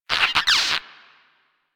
Mine Osprey Spotted, Dialog

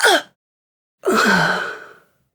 Death; Warframe; Corpus Lich; Persona C

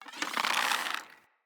Hunter Bow Ranged Charge; Weapons; Player; Bows; EnvoyHunterBowRangedMelee

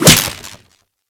Whip Impact Default, Weapons

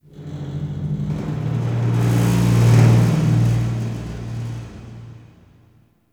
Zariman Metal Rumbles; Warframe; The New War

